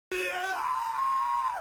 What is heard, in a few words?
male scream